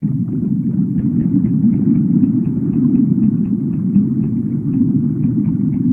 underwater; Indev+ 0.3 Portable

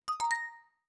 Windows Print Complete; Pearl

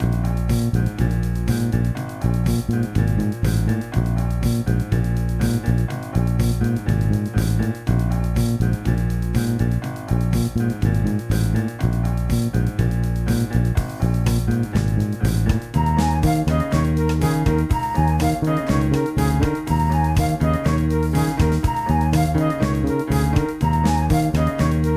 Classic Blues

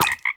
frog hurt; Indev+ 0.3 Portable

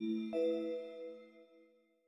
Windows Logon; GMM Windows 10

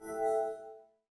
Windows Battery Critical; Glass

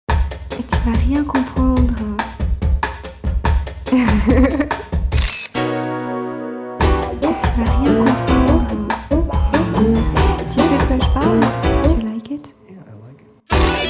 PAPAYAPA BOSSA; Beatmania IIDX

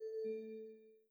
Windows Hardware Fail; GMM Windows 10

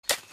grass, steps; Indev+ 0.3 Portable; oldsound

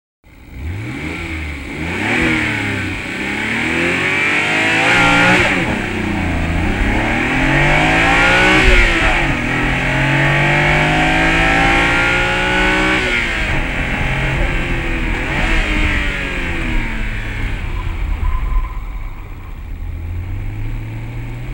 Boost Sound

